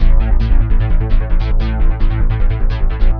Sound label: Lobby Music